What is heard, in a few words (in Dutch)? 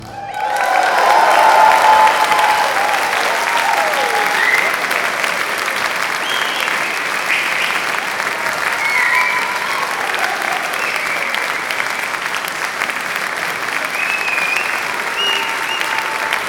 Applaus; Almen; Misa Criolla